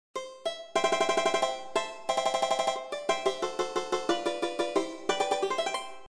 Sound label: Banjo